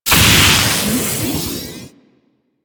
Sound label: Lightning Rod Strike; Warframe; Ambience; Corpus Gas City; Gameplay Remaster